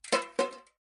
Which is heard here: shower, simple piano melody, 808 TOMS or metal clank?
metal clank